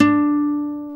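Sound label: GUI NOTE, acoustic guitar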